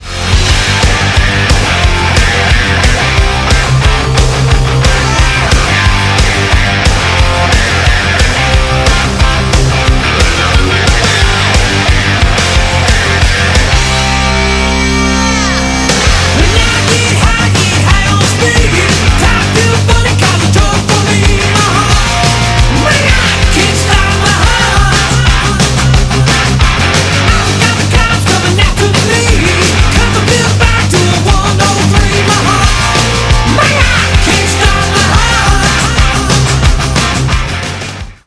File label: kickstart, disco